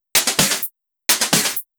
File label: Electro Loop 128BPM; Vengeance Samples